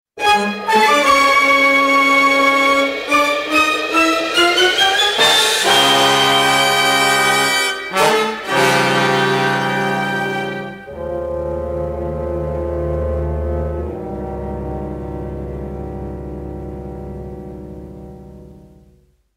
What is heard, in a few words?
Dramatic Bridge